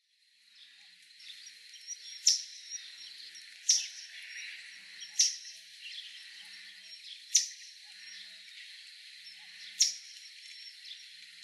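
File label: Common Kingfisher; kawasemi; oyama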